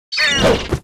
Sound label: attack